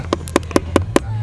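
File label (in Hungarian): ronda hang, Peugeot 207; totalcar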